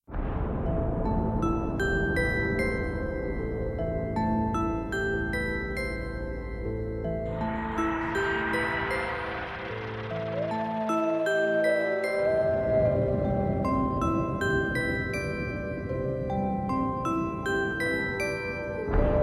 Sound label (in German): Uhu Wolf; musique instrumental